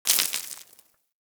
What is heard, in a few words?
Bullet Impact Debris Dirt; Warframe; Weapons; Bullet Impacts